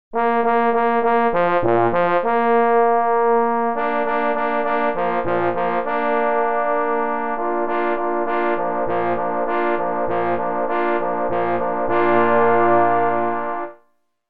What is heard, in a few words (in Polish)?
na 3 parforsy; K. Kryk; Chwala odznaczonym